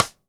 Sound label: DW Green Maple Cross Stick; Sonic Foundry (Sony Creative Software); Vital Drums